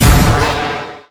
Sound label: force staff